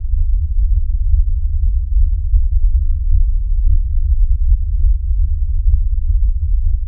Earth Rumble Loop, earthquakes

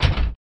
Car Door Slam Int Hit

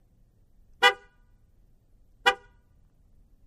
Expedition Horn Honk, ford expedition

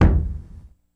Staff Hit Single, spears & staffs

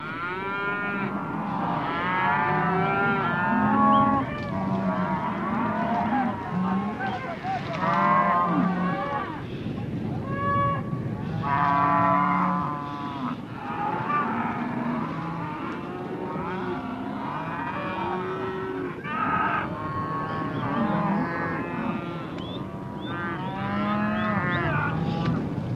Cattle Drive, cows

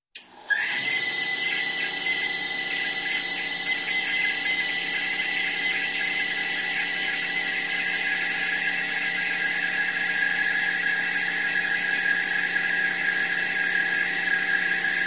Wood Shaper Tool On St, shaper

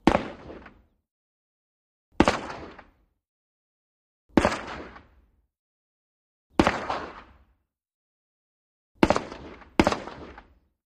AK47 Single Shots Ste

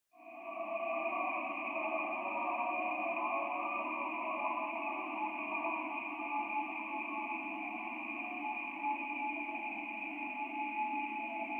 Ambience Alien Fore; drones

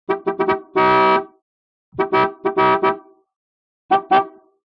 Horn 1940s Car Horn

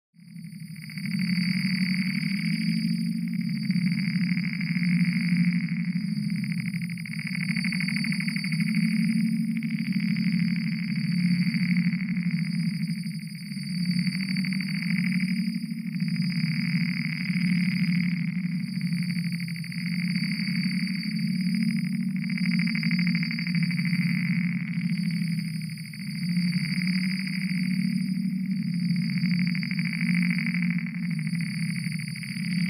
Alien Cosmic Transm, radios

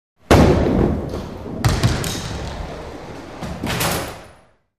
Bowling Ball Rolls

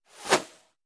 Arrow Sizzle Bys Medi; bow & arrow bys

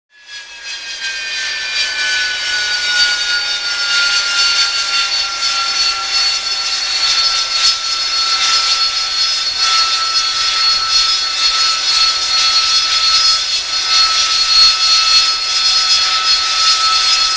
Jet Engine Idle Harri, av-8b harrier

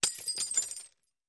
Glass Impacts Debris; windows & mirrors

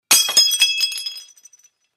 Saucer Smash, china

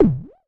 interface error, error tones

